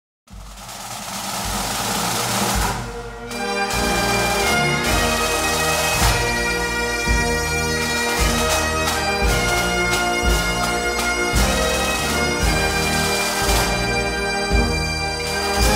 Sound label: Scottish anthem